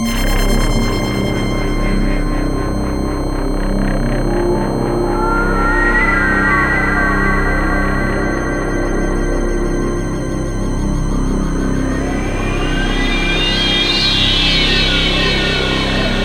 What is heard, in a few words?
some poly sounds